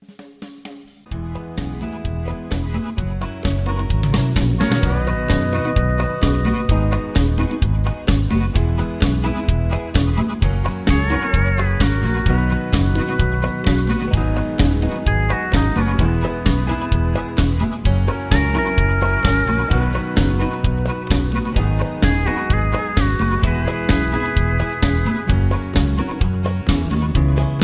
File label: Patter